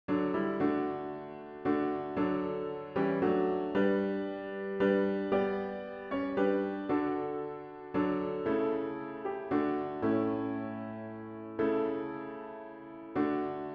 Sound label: Just as I Am without One Plea piano with Intro